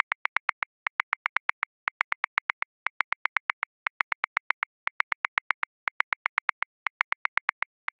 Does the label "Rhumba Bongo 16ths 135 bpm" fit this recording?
Yes